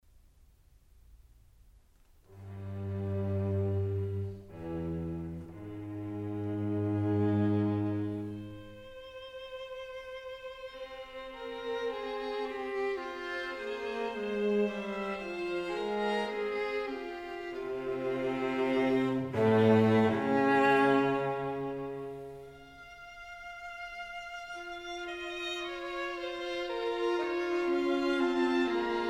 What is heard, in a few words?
String Quartet in F major